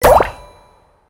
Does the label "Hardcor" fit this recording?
No